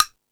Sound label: Percussion, Drum Machines